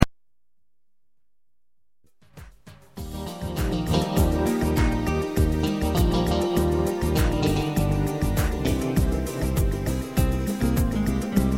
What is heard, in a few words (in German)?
blue gitarr, country